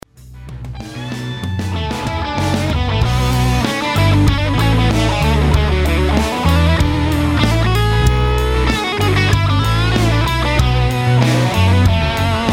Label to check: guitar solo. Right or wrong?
Right